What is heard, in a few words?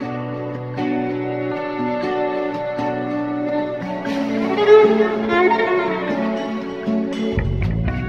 guitar and violin, Loops Sad